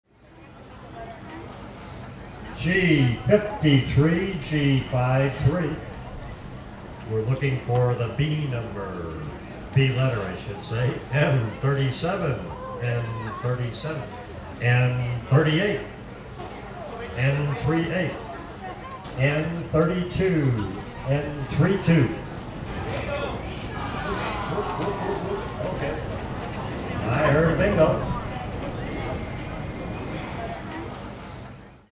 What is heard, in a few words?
Bingo In Arlington Heights